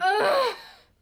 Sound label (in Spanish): Grito Mujer